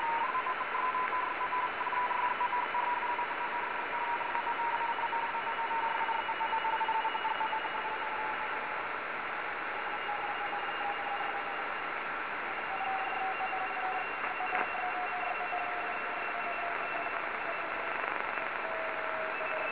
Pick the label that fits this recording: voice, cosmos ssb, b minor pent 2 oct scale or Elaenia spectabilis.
cosmos ssb